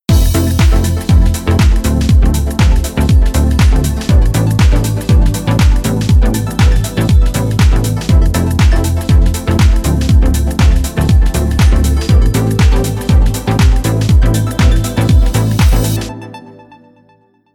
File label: Synth Seq Arp All